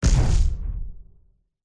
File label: sound design impact designed glitchy thud; zapsplat